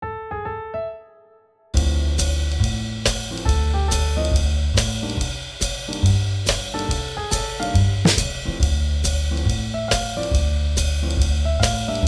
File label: Swing Jazz